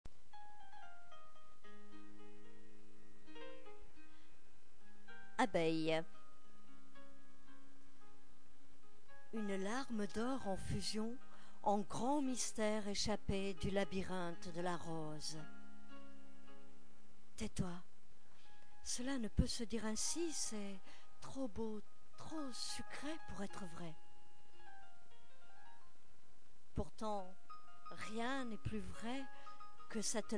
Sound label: piano